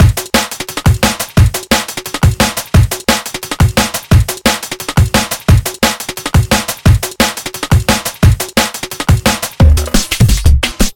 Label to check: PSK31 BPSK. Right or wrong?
Wrong